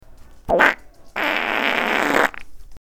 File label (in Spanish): Pedo